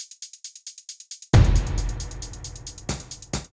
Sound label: Beat Hats & Toms, 135bpm; Zero-G Sound Sense Grime Scene; Construction Kits; A Positive Vibe